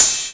cymbal; Atari Mega Archive - Volume 1; music

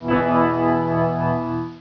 Trem; Total Distortion Big Demo

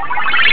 magic Wand

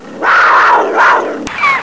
JAGUAR

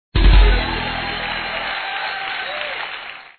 goal sound; ganparu sushi bonpasu

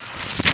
cash drop; vampire demo

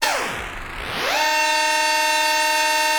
D+B 160; eJay

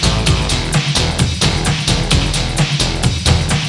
drum loop

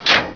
bad door; Hexen II; doors

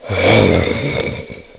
zombie pain; TheyHunger3

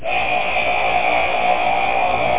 Blow Torch; wormnoiz

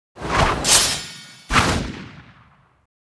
FRONT WHIRLY BLADE; BloodRayne

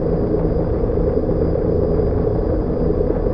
warp engine; Earth and Beyond; EA Games

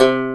banjo; cubic

